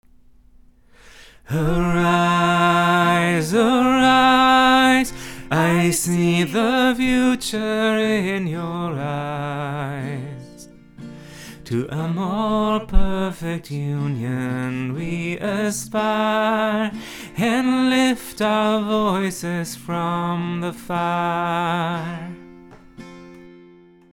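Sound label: bass, choir music; BCC choir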